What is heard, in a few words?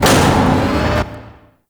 Large Elevator Start; Doors